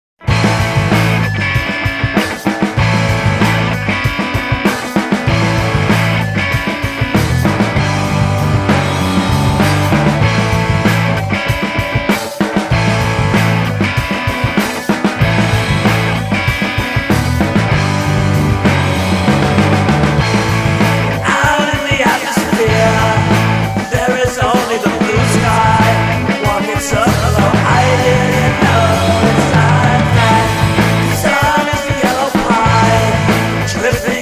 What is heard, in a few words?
atmosphere